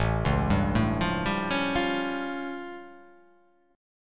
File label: A Augmented 7th A